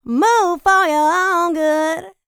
LICKS, Vocal R&B Dance; LT DANCE

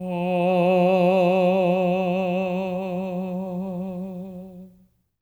TENOR F#3; Spectrasonics; Symphony of Voices

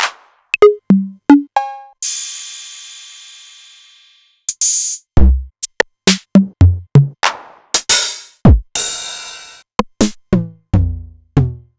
Novation Drumstation; DrumstationV1

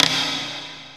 SNARF, percussive; Rarefaction; Poke in The Ear With A Sharp Stick

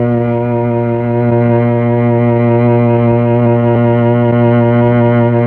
STR, Melo. Cello, Tron Cello; Roland LCDP09 Keys of the 60s and 70s 1

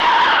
vocal

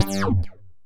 Synth Hit G; Zero-G Trance Inducer; 90 s Sample CDs

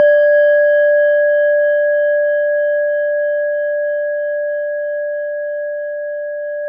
JAPANESE, Japanese Bowls

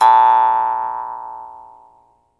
Jaw Harps

